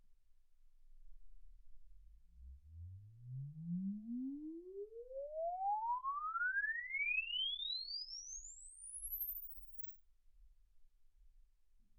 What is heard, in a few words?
exp swept sinus 10Hz 24kHz